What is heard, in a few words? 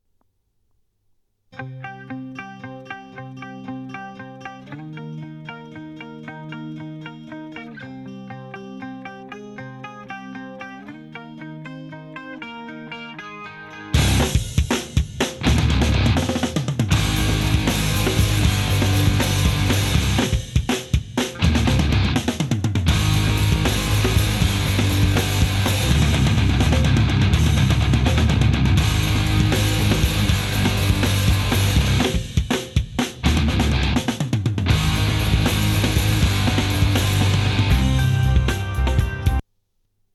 jbl110bt record no eq